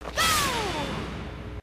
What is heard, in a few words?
Boom!